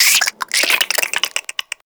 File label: Infested Minion Attack, Vocals; Warframe